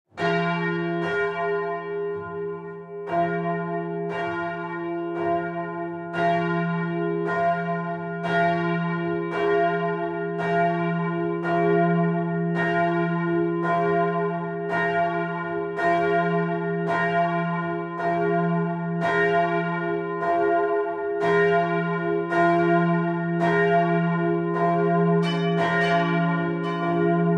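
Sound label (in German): Glockengeläut Kirche; Romrod; Romröder Kirchen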